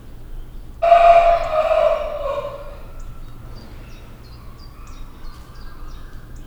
bement a majomházba, aranyhasú mangábé; debreceni zoo 2012; marantz puska

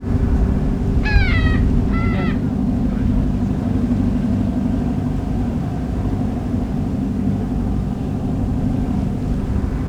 vijjogás a kompról, sárgalábú sirály, Thassos; allathangok; termeszetben; marantz

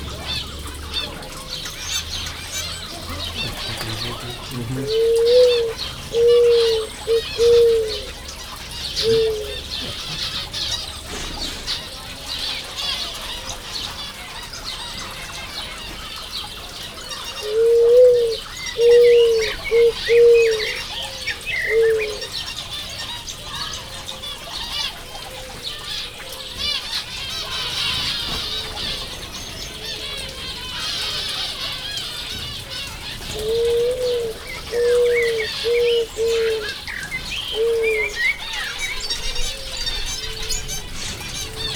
erős háttér, fokföldi gerle; jaszberenyi zoo 2015

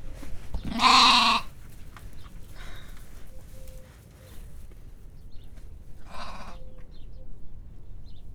röviden mekeg, házi kecske; Hortobágyi Nemzeti Park 2013

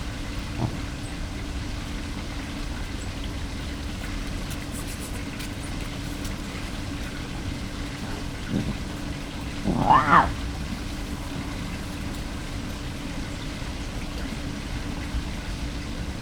éjjel kétszer halk, mocsári hiúz; jaszberenyi zoo 2016

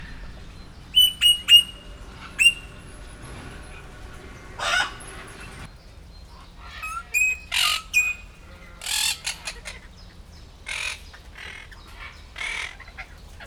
három részből összevágva, rozellapapagáj; debreceni zoo 2019

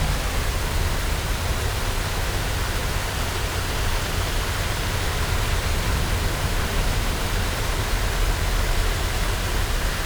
kolkiszszokokut autoforgalom

